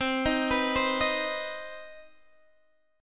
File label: C Minor Major 7th C Guitar DADGAD